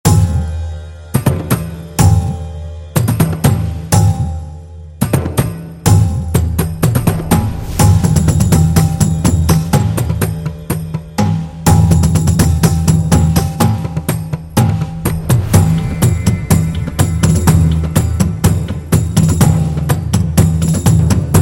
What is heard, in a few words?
Indian Tabla